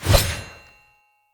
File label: Throwing Knives Throw Heavy